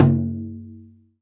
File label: GENDANG, GENDANG DRUM; Spectrasonics; Heart of Asia